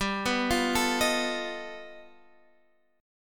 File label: G Augmented 9th, Guitar, Half Step Down